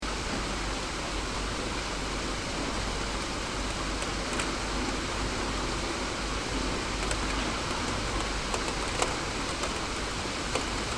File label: Rain Inner Yard Cars From Distance; Weather; Rain - Inner Yard - Cars From Distance 2; CraftedSignals